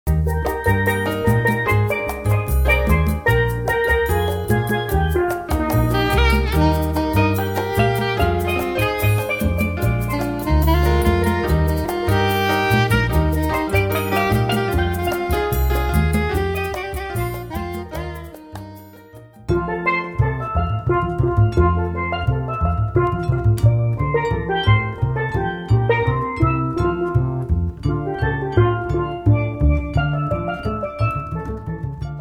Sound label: Island Calypso Band